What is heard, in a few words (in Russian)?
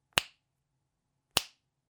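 щелчок пальцами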